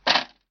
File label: Launchpad